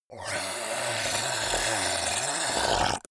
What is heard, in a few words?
zombie grunt choke male wet; kreaturen